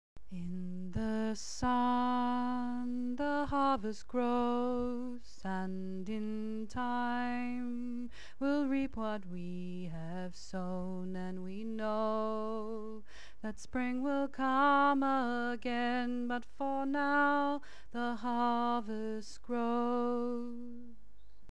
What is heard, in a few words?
In The Sun Chant; Lughnasadh